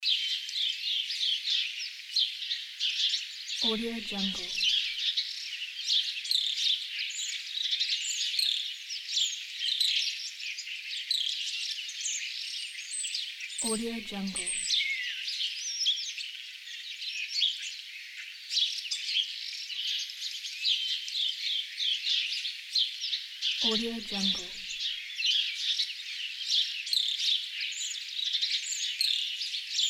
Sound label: birds; آواز پرندگان پر تعداد; nature sounds